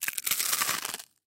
zvuk ukusa

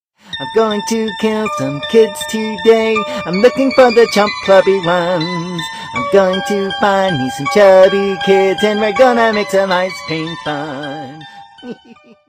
f morojenchika rod song, zvuki furgona morozhenshika

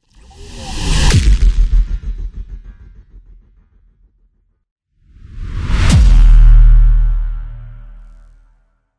perehod s effektom reversa

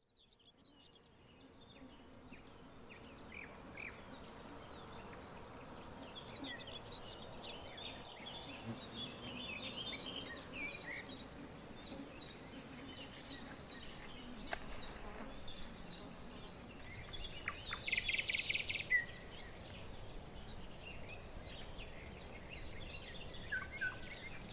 priroda polia

